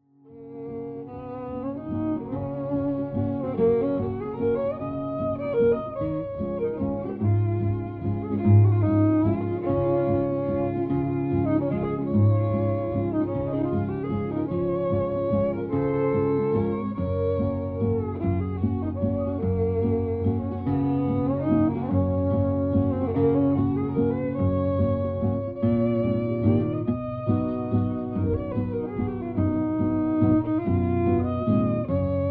waltz f

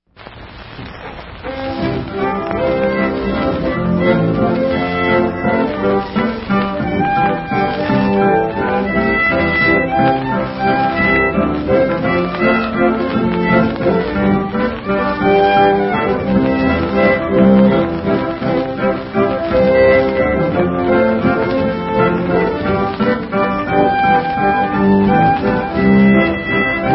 Waltz

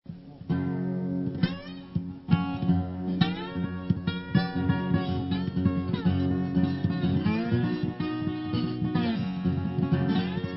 instrumental

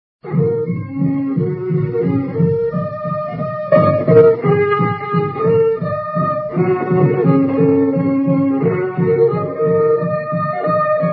waltz

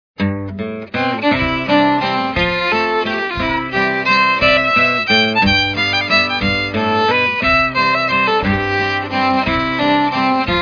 Waltz

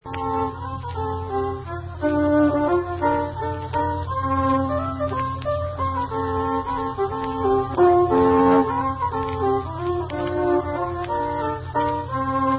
Waltz G

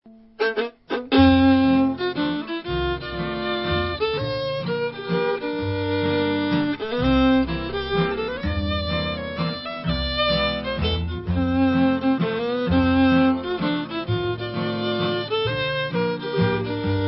Waltz

